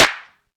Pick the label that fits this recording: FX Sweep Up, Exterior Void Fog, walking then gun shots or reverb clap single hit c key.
reverb clap single hit c key